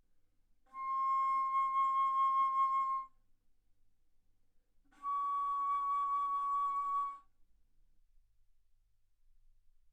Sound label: Bass Flute C6 Db6; ff